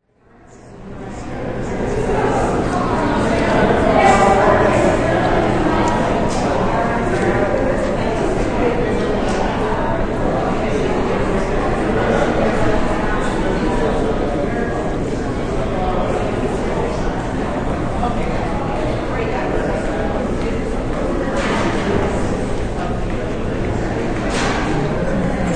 Background ambience of a Smithsonian museum setting.; Buildings